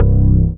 Synth Bass, Roland

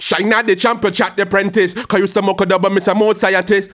HAL RAGGAM, RAGGA-MUFF A maj

